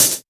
Perfect Open Hi Hat C# Key, Royality free open hat one shot tuned to the C# note